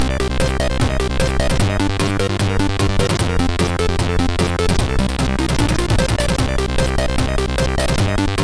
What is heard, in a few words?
Crappy Tune, CSG8580R5; Managed to do a crappy tune